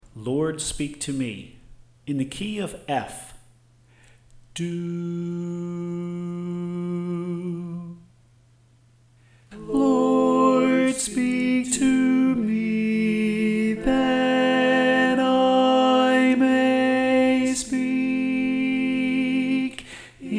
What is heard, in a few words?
Tenor